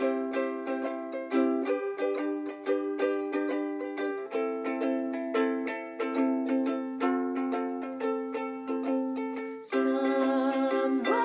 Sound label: Ukulele Voice